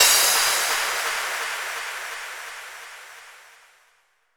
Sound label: FX Impact